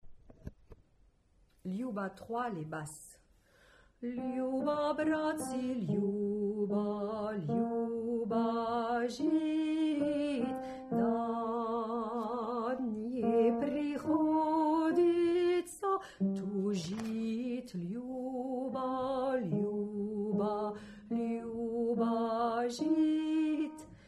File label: Bass